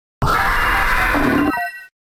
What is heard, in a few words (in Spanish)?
Grito de Palkia